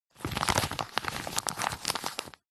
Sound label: money 20 dollar polymer note light handling movement